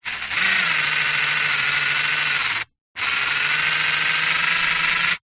science fiction robot motor server movements

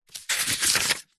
book large thick page turn; zapsplat

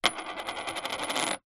foley money coin set down on wooden floor; zapsplat

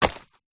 impacts tree bark piece wet drop ground; zapsplat

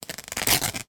foley cloth rip; sound effects one

